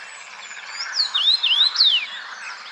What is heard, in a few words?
Common Rosefinch, Karmingimpel